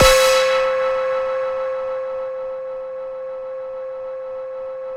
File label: JV-1080